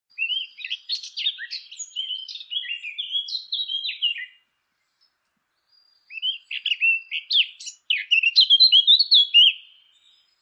CAPINERA

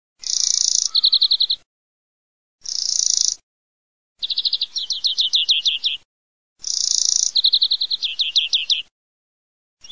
VERDONE

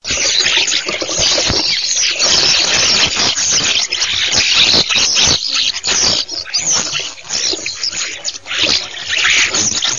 STORNI

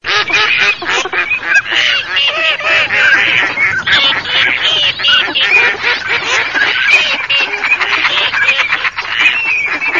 CODO ALZA GERMANO

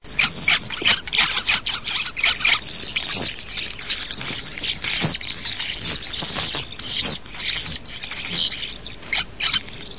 STORNI

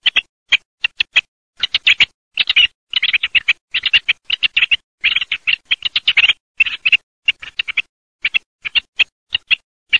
COTURNICE

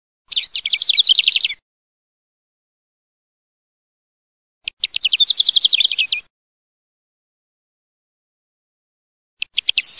calandrella, birdsound

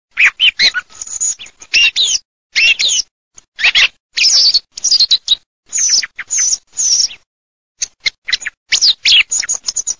TORDO, birdsound

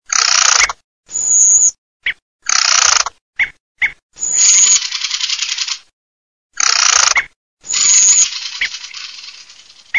tordela crecre sassello

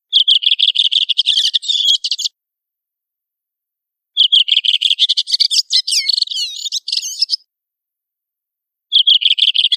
Tordo sassello

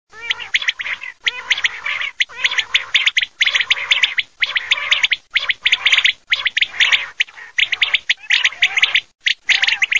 QUAGLIA

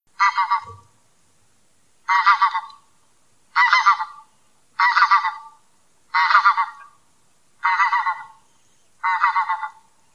oca selvatica, birdsound

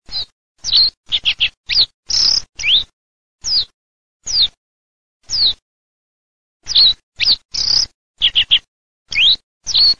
MIGLIARINO

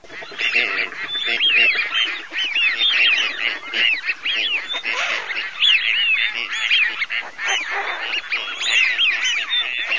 FISCHIO CANAP GERM F

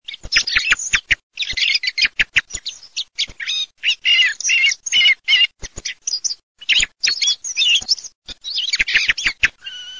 TORDO MISTO

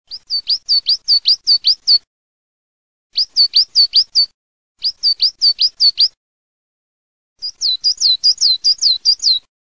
CINCIA MORA CINCIA M, birdsound

